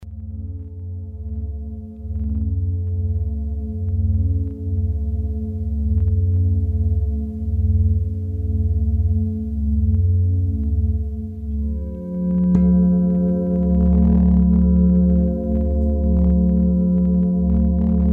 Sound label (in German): Klangschalen